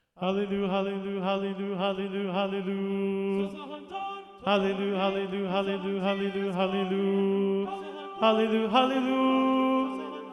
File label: Incantations SATB Tenor 1 Predominant